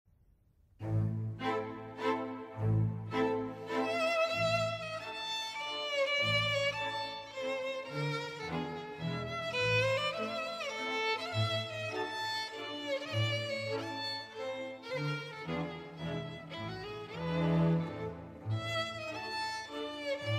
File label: mazurka